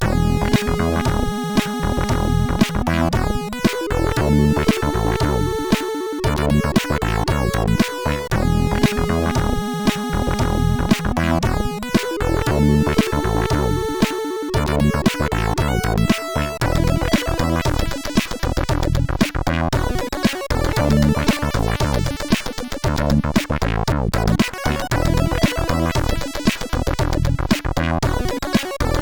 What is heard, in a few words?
disco con tutti, CSG8580R5; hvsc